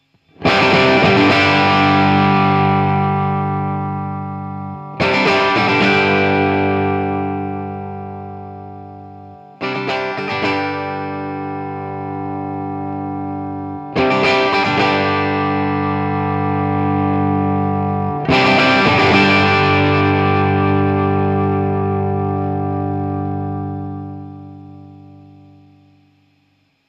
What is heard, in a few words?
Electro Harmonix 5MM Volume Drive